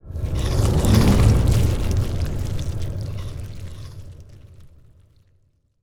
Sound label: ant ship colossus move; biogenesis